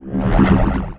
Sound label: gravity link pass effect; Earth and Beyond; EA Games